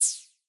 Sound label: menu close